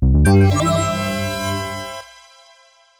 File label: Fintech Sig, sonic branding